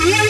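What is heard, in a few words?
synth bended; Best Service Gigapack 1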